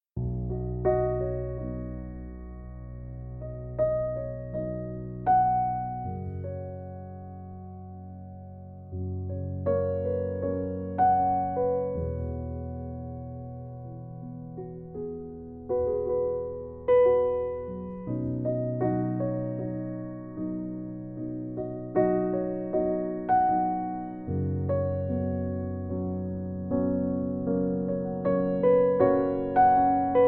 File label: Piano Version